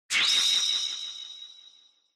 Onoma Syakiin Light

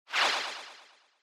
Motion Agility Short Delay

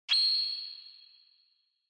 Flash High; Onoma; オノマトペ 光る

